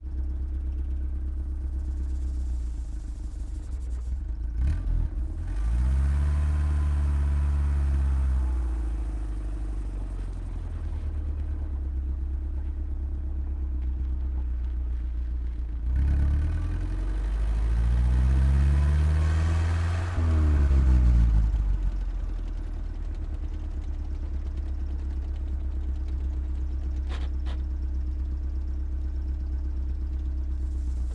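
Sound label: gaz-69 onboard medium drive stop off exhaust; dpa4062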